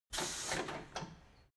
construction garage tire changing machine hydraulic separator